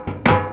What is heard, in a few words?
Mechanical bad word; spring; puzzles